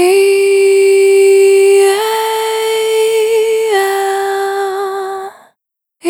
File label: Vapor Dry, Single Voice Melodic Loops; Pocket Diva World Class Vocal Samples